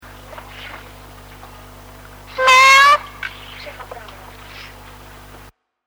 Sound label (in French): Chat